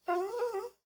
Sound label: whine, wolf